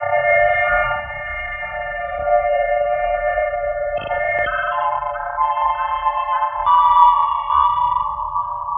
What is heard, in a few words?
Vibrato Flute